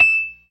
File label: PERC, Synth and Organ